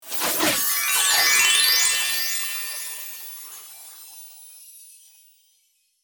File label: Magic wand sparkle